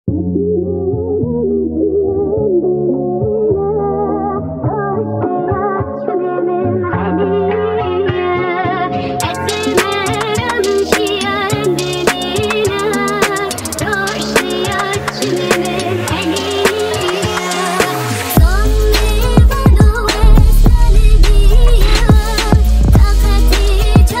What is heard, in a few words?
kurdish trap remix